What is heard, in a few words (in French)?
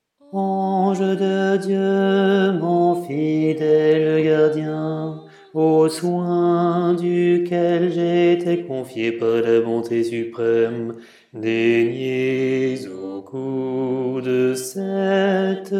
priere a l ange gardien basse